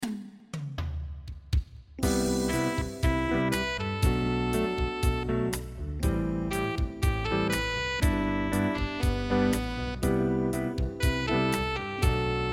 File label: Lite Groove